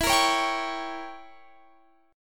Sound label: E Diminished 7th E, Guitar Standard; Edim7 strummed; JGuitar